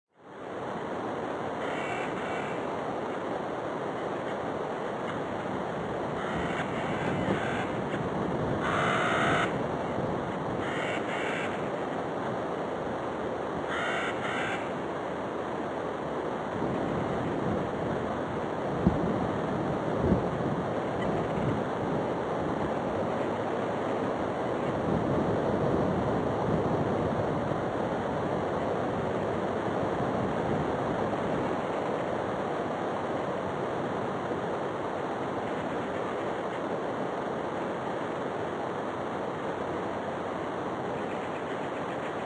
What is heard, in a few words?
Desert Ambient